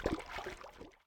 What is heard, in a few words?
Underwater - Swimming; RadwinIsland